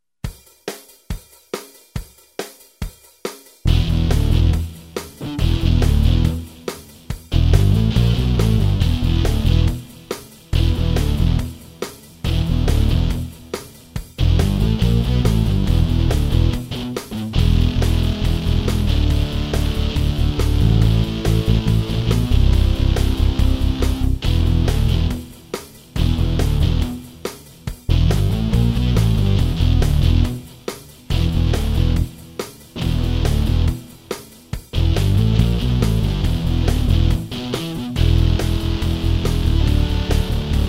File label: car music